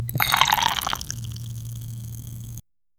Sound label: soft fizzing pour of a, beverages